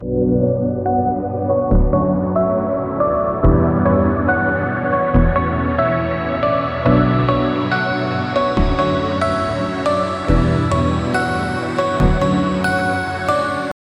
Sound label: Sine, Bell, Pluck, chord progression with slow melody, B minor, high reverb; Audialab EDM Elements; rising low-pass